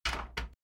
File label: Door Exit; the friends escape the friends escape 2 windows 2013